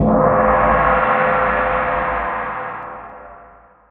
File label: Gong